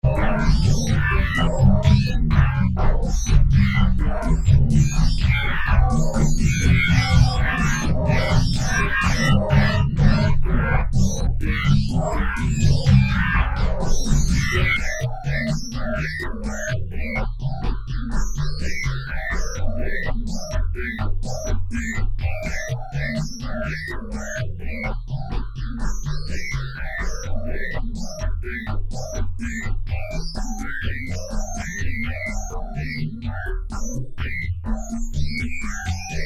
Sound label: Bug Jam